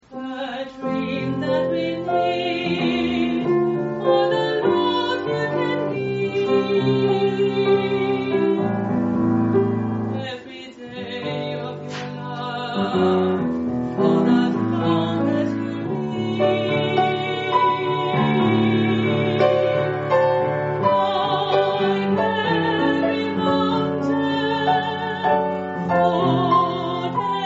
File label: alto